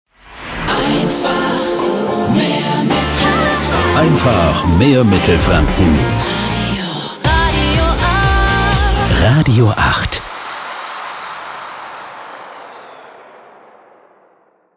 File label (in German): Transition Slow Pop aktuell 2 Fast Rock 80er; RADIO 8